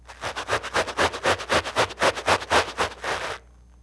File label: brushing carpet